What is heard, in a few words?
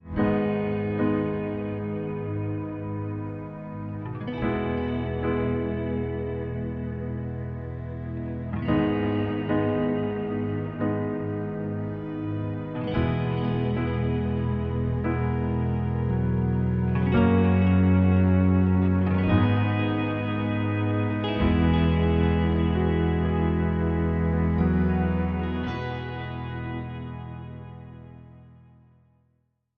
Ambient